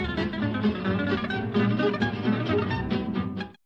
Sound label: arp major; django